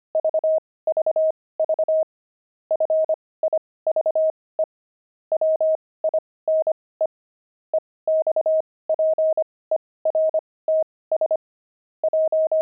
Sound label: pangram, intermediate pangrams files; wine